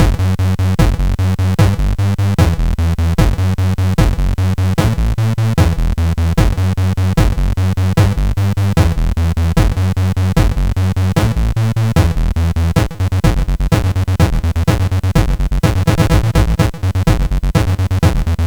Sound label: Tune, sid MOS6581R4; hvsc